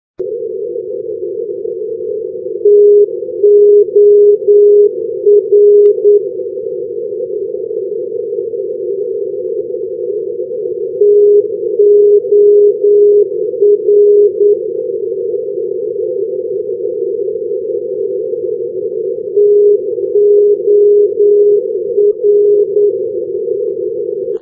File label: ndb rovaniemi toramo; tor-416; haparanda; europe